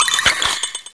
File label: cry